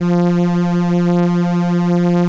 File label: ELKA, String Machines; ELKA RHAP